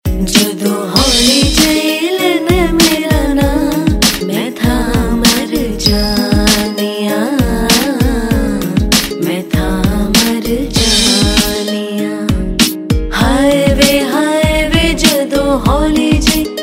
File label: Ringtone, Punjabi